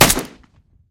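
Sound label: accurate hit on enemy target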